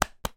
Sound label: human hand slap belly x2